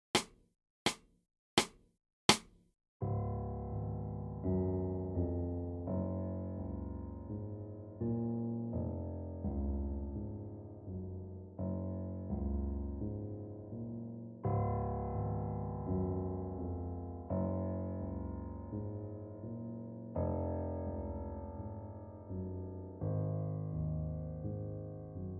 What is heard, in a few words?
Black Note Improvisation Teacher Acc